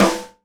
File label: SNARE RIM, Live Snares